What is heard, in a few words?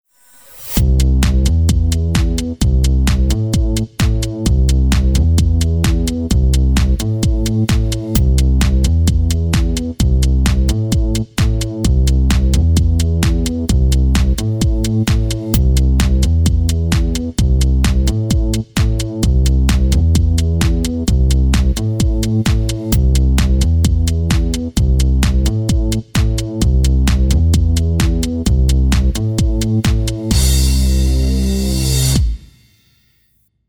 BED Deep and Steady